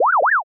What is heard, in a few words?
scratch